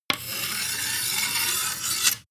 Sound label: sword scabbard draw slow